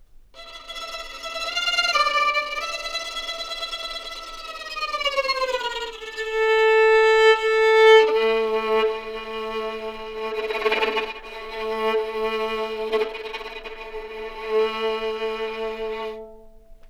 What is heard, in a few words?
violin samples Fear